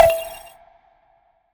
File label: E-Perc Blop Charred, Electronic Percussion; Drums; Ableton Live 12 Lite; Core Library